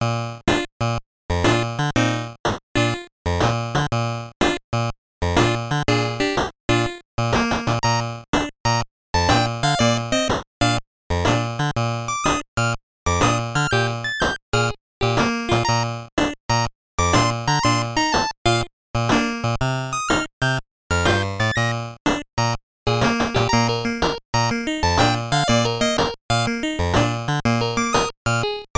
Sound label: Puzzle BGM; O-chan no Oekaki Logic; WonderSwan